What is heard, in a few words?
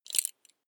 metal belt buckle clatter regular